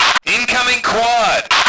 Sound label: radio; lmctf; andromeda